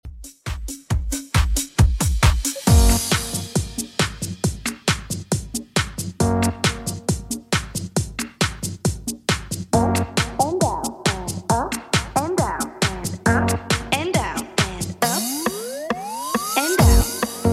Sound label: 90s Redrum 136bpm